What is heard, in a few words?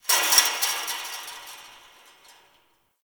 Fence Rattle One Off; Warframe; Nineteen Ninety Nine